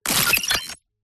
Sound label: Micro Mechanics Tonal; PMSFX